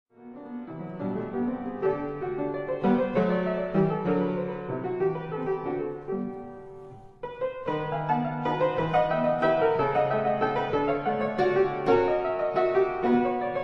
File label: caprices, for piano; Amusementes (3) en forme de caprices; Op. 105; a la Styrienne